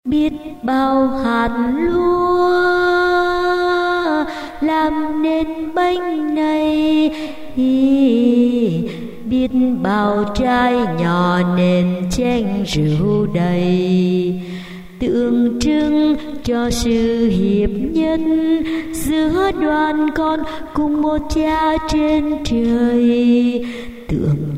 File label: basso